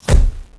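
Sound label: flush pot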